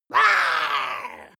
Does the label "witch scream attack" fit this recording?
Yes